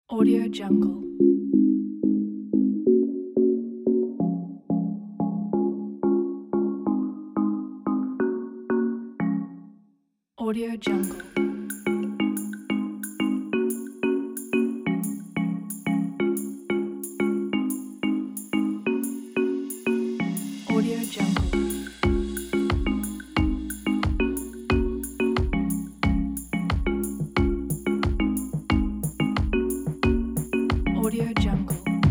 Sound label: joyful electronic pop